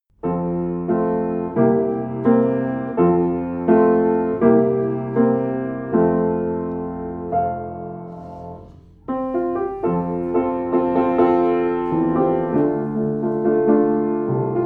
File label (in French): piano seul